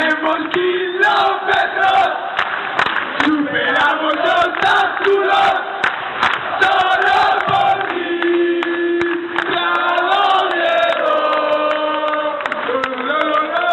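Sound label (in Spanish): recorremos kilometros, football chants; spain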